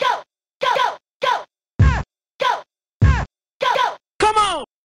noise; sonic sprunki

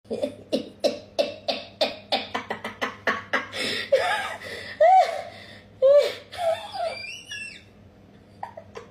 laughing

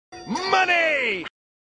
money sound effect; mr krabs; krusty krab

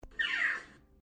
meow; floppa

